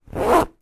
handbag pocket zipper; foley

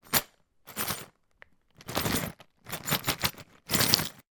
odds and ends in pot shake keys pins batteries

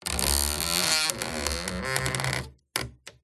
Stress Creak Squeak Groan; PMSFX

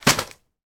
newspaper folded drop on floor; sound effects one

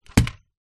household led lantern lamp place down on surface

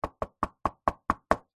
foley wood knocking fist several times; zapsplat

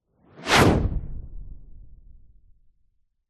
warfare missile rocket pass flames booster

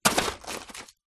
foley charcoal pieces impact in metal fire pit; zapsplat

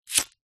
household cigarette lighter light; zapsplat

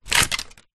leaf dry heavy move ground; foley

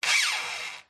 Wood Stress Creak Squeaks; DPA4060; pmsfx

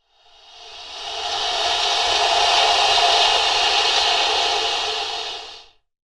animals alligator hiss

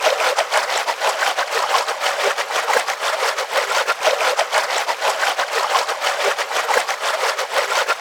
liquid splashes mechanical reversed loop; sound design; sound effects; sound effects one

